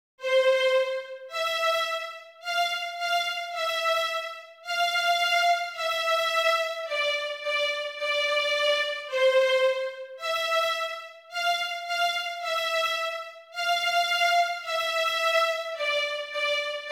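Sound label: Happy Farmer Violin 3; Schumann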